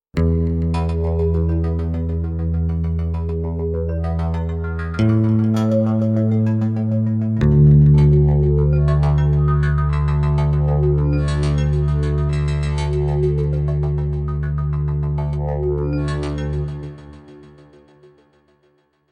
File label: Mellow electric